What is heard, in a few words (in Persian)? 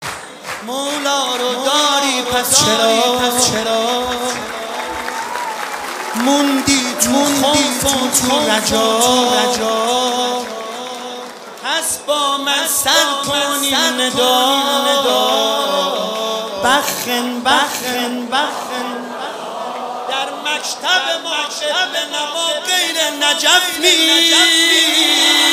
مولودی